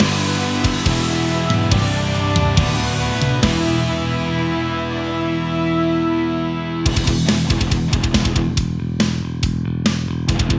Fanfare Win Start

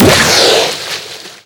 Shoot; Skylanders Cloud Patrol; Arcade, iOS, Mobile; ThumpBack SFX

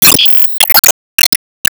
Enemy Work Common Vanish

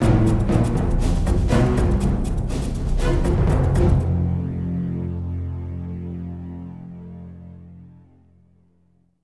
Combat Lose; The Hobbit; PS2

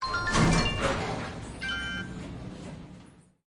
Mineshaft Elevator Start Upward; lethal company

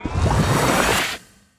Enemy Evolution Active Charge; gamerip; disney star smash; android

